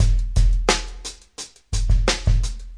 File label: dragging basic beat, drum loops and breaks, 086 bpm